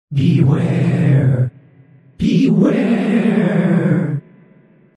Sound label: Ghost, Special Effects